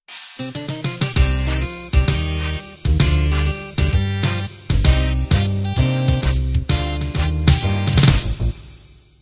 swing band intro

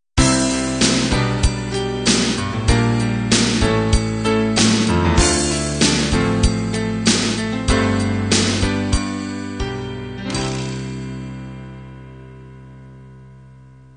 rock ballad end

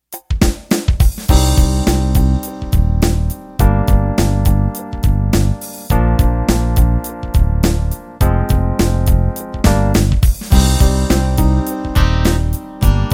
Pop Ballad Intro

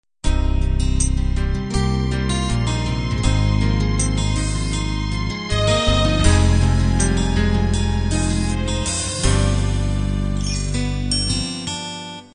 guitar ballad intro

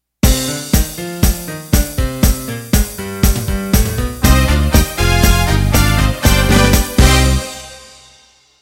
Motown End

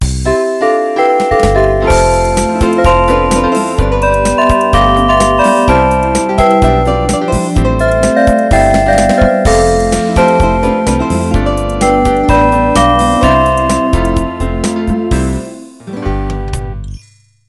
Beguine Intro

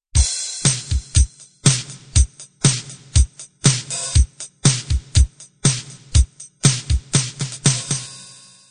bubble gum intro

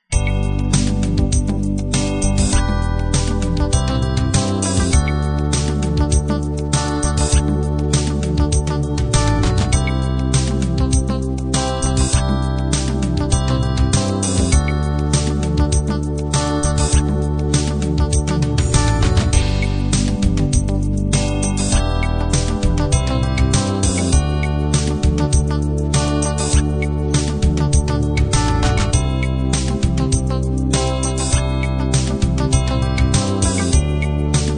pop ballad

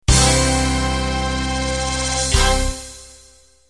Slow Rock End

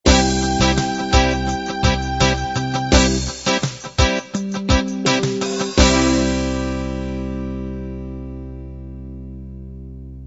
pop ballad end

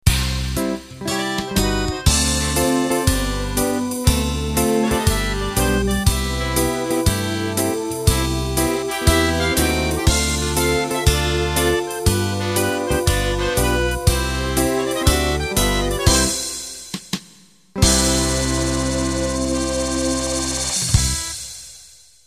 Euro 2Beat End

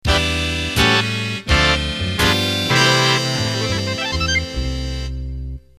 Big Band Slow End

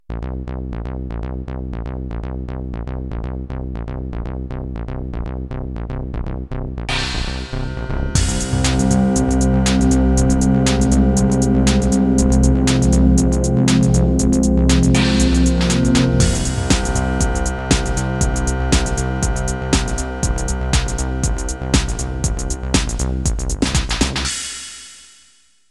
Progressive Intro

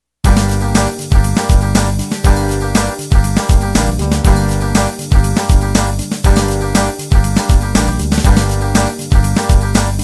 6 string beat

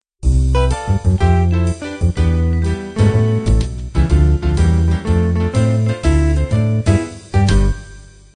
jazz quintet intro

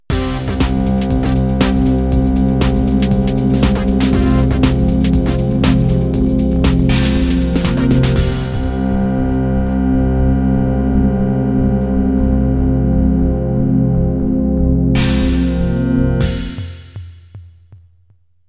Progressive End

